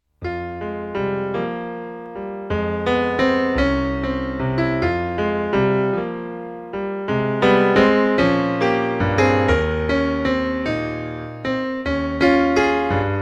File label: Valse Viennoise